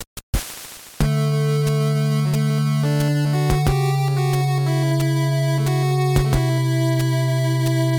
ending staff roll; top-gun

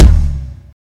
kung

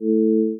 pad, retro synth; agentmag

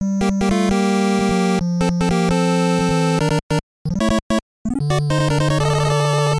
enemy robot selected; mega-man

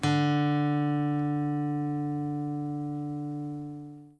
guitar 4th D

